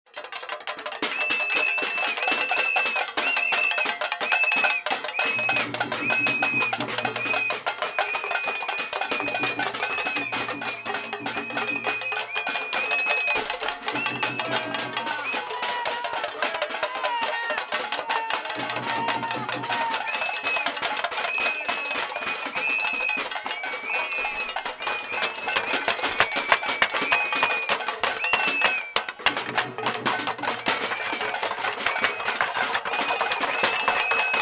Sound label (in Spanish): cacerolazo Pitos Gritos